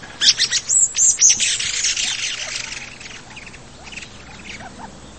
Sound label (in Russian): zvuk peniya s vibriruyushim golosom